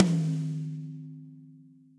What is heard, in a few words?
Tom Mid Studio Basic; Drum Hits; Standard Hits; Ableton; Factory Packs; Session Drums Studio